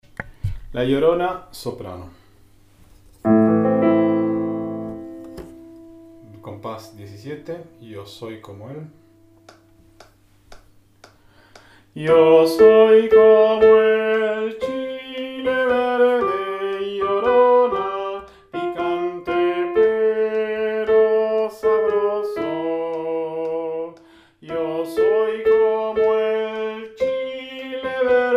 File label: Soprano